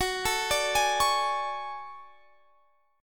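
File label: D Major Sharp 11th G flat, chord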